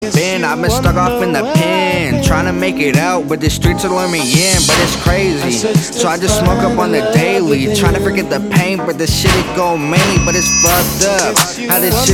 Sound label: Hop On, Hiphop